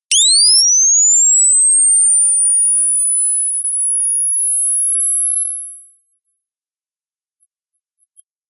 shum podobie ultrazvuk

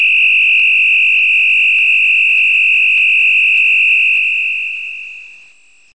elektronnij zvuk signalizacii

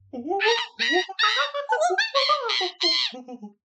zvuki obezyan

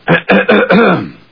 otkashlyalsya pered vazhnim zayavleniem

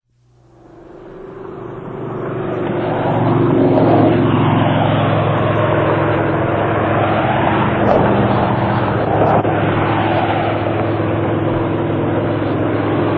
sportivnij samolet

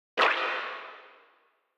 unikalnij volshebnij zvuk perehoda

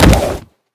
zvonkij svistyashij udar

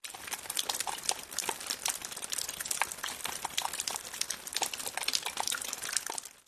krov techet kapli krovi

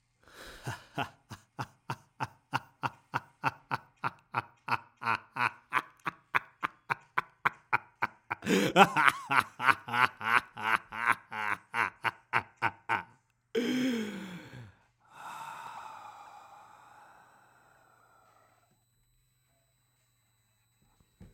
zloveshij smeh cheloveka

